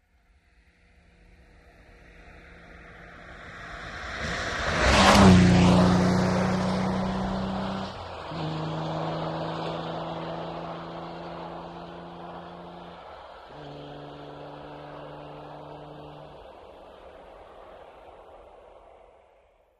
Aston Martin Gear By; ext.

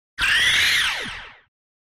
Synth Energy Zaps

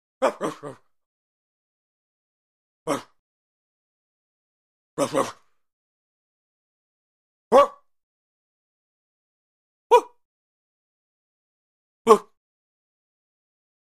Dog Barks 9 Dog Barks; animals dog

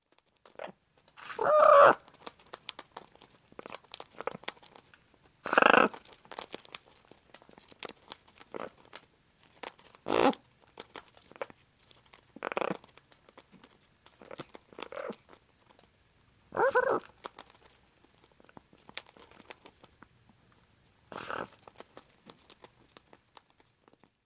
Parrot Eats Seeds

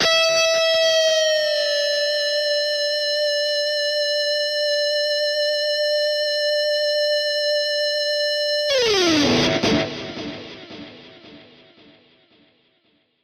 Elect Guitar, electric guitar

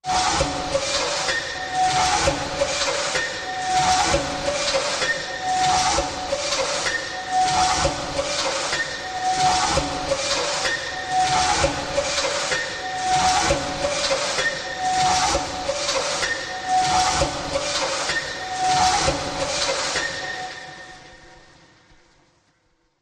Rotary Trepidation, machines winches

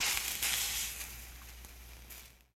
Fizz Sizzle Brief

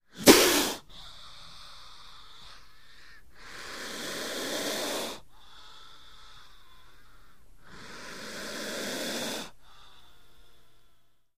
Cobra Indian Snap, snakes

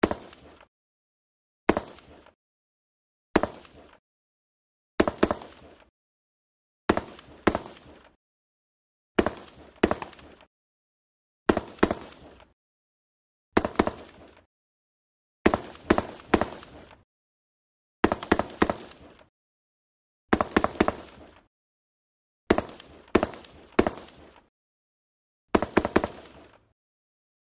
M-16: Single And Multiple Gunshots, Distant

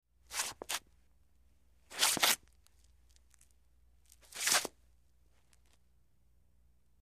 Footsteps Brick Scuf

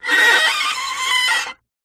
Chalk Screech Blac, squeak

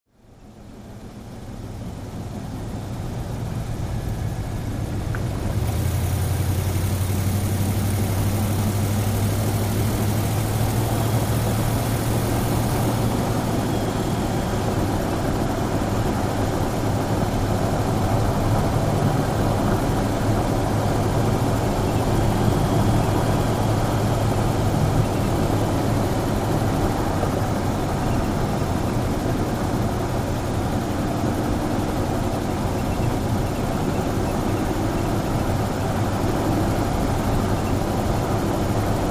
Wagoneer: On Board Medium Driving, Jeep Wagoneer

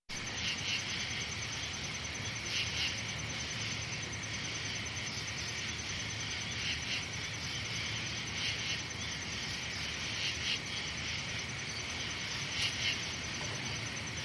Country Night Ambien, forest

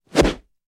Medium Swish

